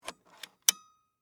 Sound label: HD Camera Open, Hd, Camera, Open, Outer, Cassette, Door, Sony, Hvr-Z5; Camera Sound Effects; BlastWave